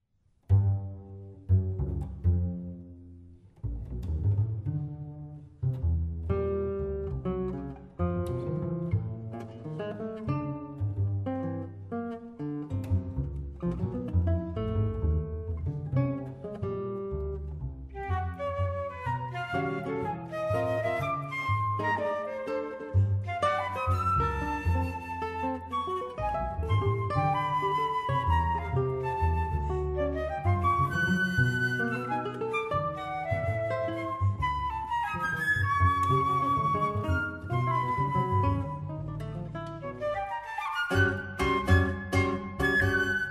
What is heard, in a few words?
Swing Fugue